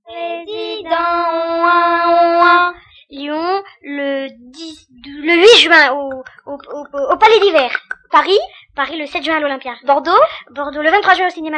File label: Jingle